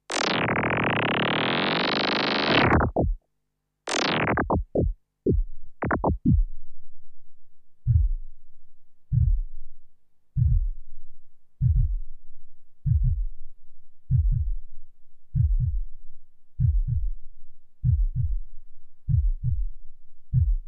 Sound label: Korg 05RW; Korg 05R/W Synthesizer module; Weirdness2